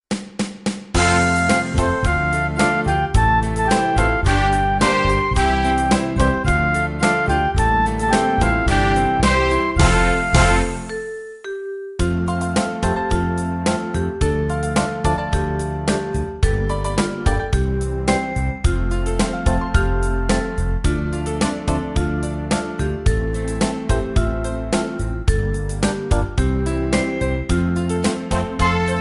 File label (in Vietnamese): beat